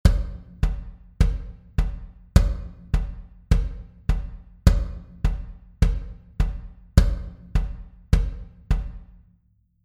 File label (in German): Takt